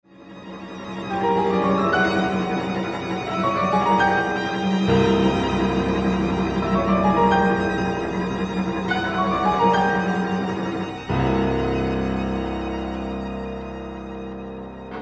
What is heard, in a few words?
Piano Quintet